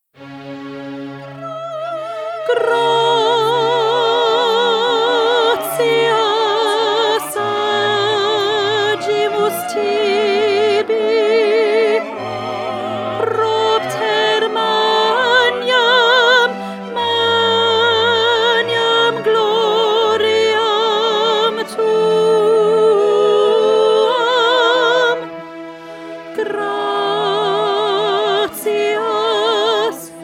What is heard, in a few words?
mozart mass in c minor gratias 2nd soprano